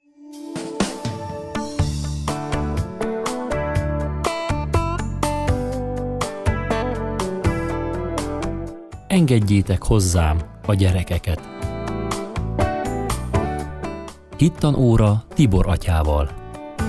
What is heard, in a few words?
jingle; Engedjetek hozzam a gyerekeket